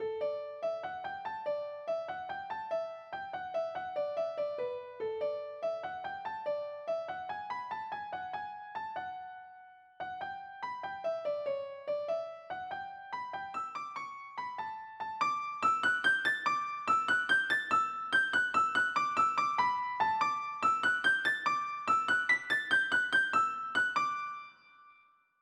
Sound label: Cantilene